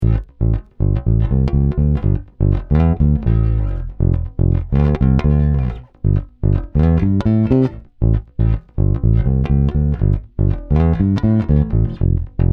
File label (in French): Passif M, basses, sadowsky